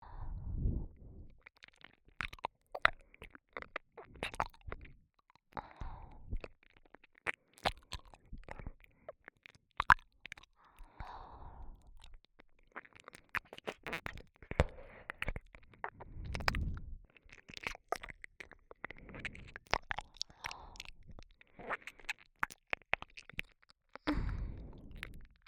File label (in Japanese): 耳舐め 右; 極上の癒し～夢見月の湯宿一泊二日専属仲居付きスイートプラン